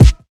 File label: BD; Best Service; Dance Mega Drums